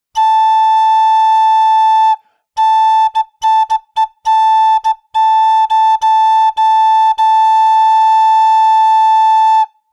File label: A Am Flute high